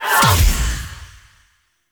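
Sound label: Reservoir Impact; Warframe; Wisp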